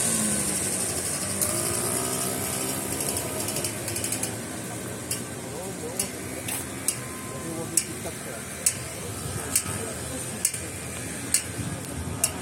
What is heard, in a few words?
traffic light click, Rotterdam Field Recordings